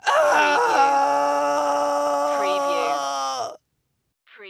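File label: Man Scream Ah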